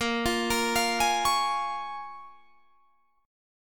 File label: Bb Minor 7th, Guitar, Drop D